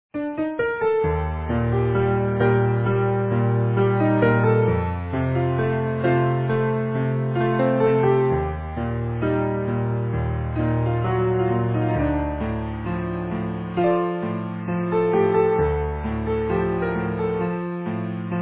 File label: easy piano